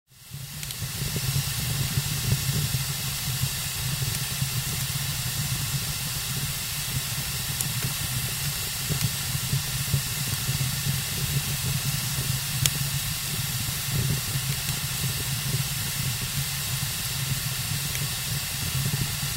Firewood is burning in the fireplace, damp wood hissing